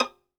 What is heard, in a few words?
SOLO VI, Synclavier Strings; ILIO